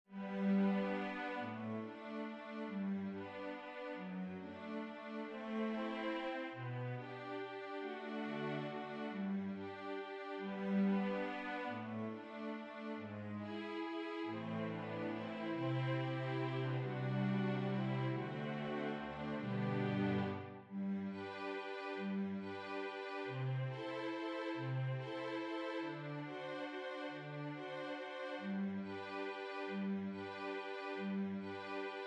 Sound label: waltz full